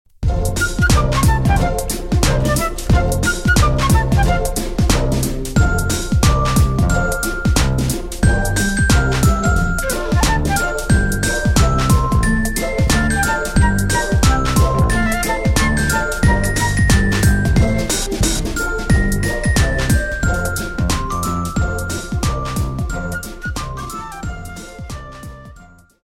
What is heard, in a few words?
Instrumental